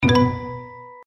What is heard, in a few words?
bonus; Stocked Bar